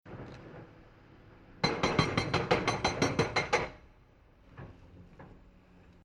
Industrial Mechanical Quarry Pecker Stone Breaker Digger Breaking Stone Medium Length; Construction Vehicle Sound Effects